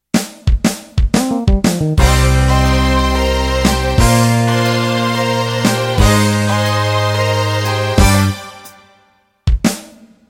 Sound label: Country Shuffle Intro